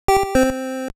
sfx alarm; Jay The Doggo

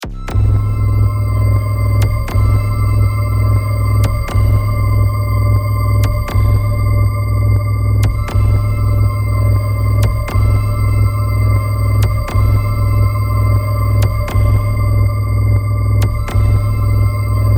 game over

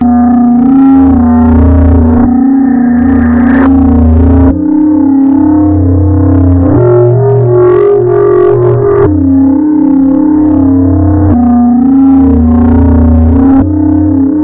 ambience

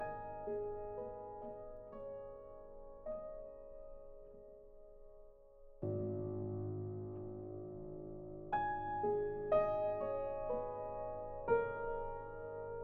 piano theme loop

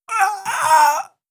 death groan male

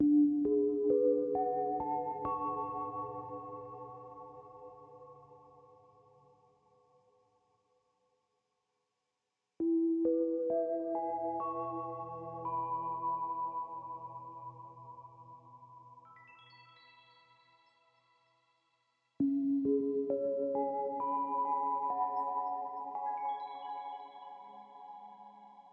midnight sands no sfx

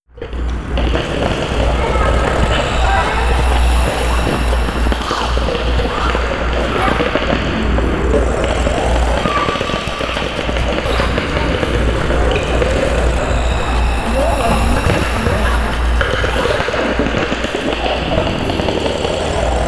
ugly atmo

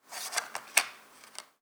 close map; Opening and Closing a Map

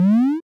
boss jump

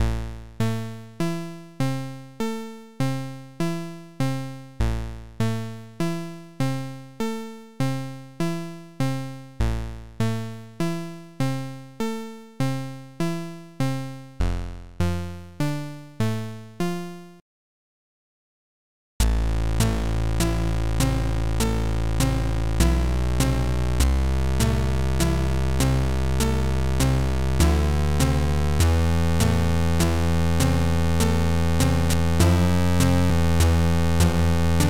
chiptune, A foreboding theme; regent

